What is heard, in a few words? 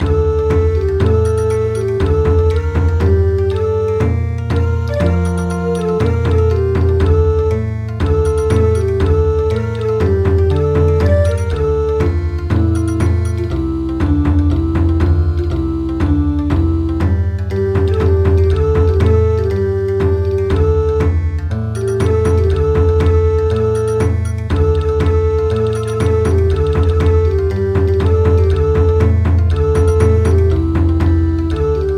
Bon Odori; traditional; Great Buddha of Kyoto